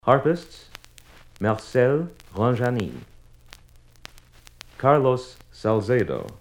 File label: Harpists